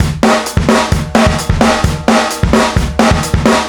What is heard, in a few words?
130 full, metal drum samples drums acoustic 130bpm; musicradar-metal-drum-samples